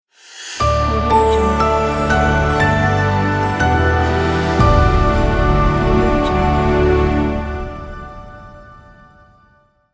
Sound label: logo